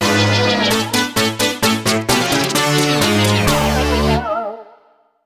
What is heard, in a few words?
Win Battle, Partner Fainted; paper mario 2 the thousand year door